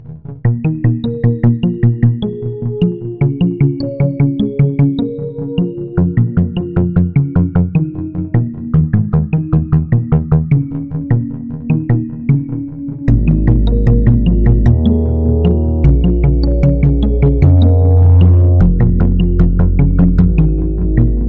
soft background music tone ringtone

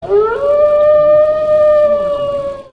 frightening wolf cry mobile tone ringtone

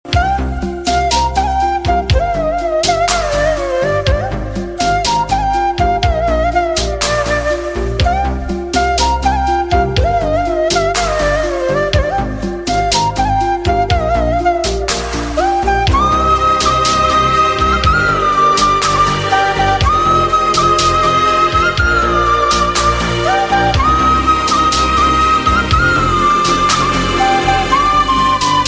flute instrumental ringtone

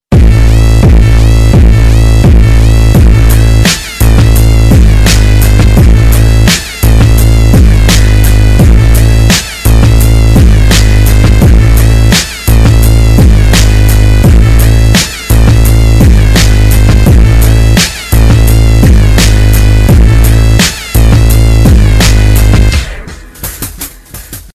super bass ringtone

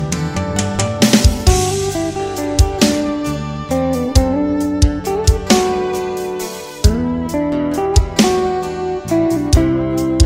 nepali christian, christian gospel

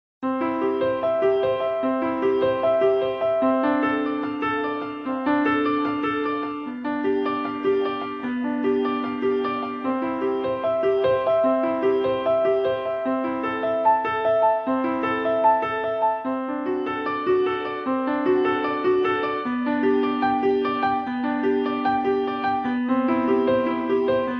nokia bach ringtone

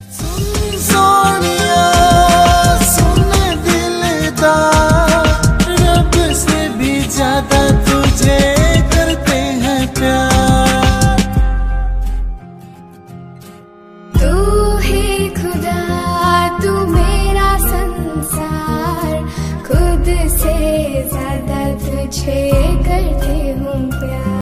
hindi gana ringtone

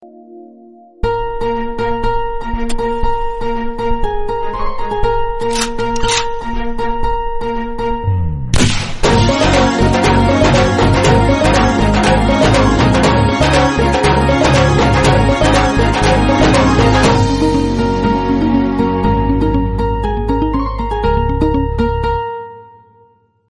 instrumental ringtone; oru adaar love

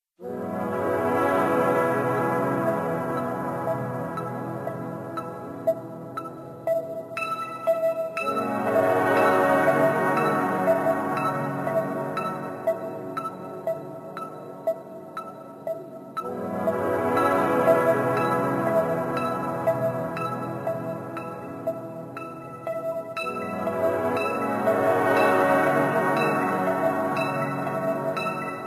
nice alarm ringtone

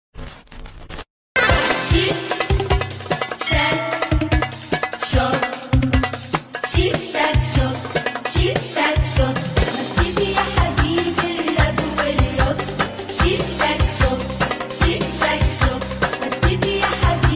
ringtone, funny

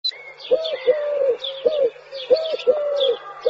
beautiful bird sound tone; galaxy

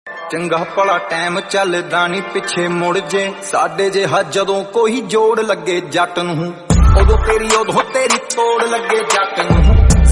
punjabi song tone ringtone

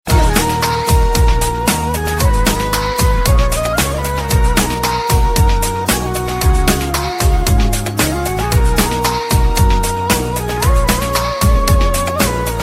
sad violin ringtone

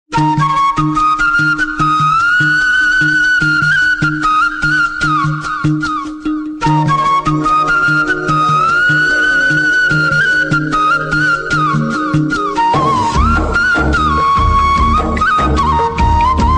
cool flute, ringtone

